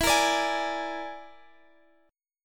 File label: E 7th Flat 5th, Guitar Standard; strummed; chord; JGuitar